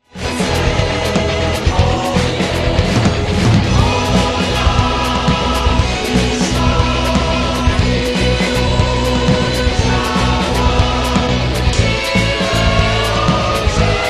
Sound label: contemporary polish village music